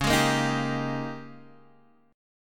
Ab Major 7th Suspended 2nd Suspended 4th Db, Guitar